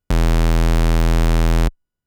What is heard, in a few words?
Square E0, keys; fleet keys Samples